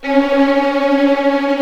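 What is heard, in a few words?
C#4 tremolo, String; East West; The Ultimate String Collection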